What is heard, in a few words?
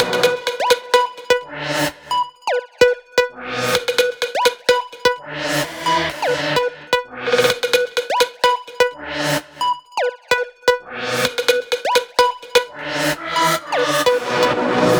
Piano Melody Beat Loops